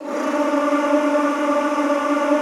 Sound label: D3, Classical Choir; Best Service; Extended Classical Choir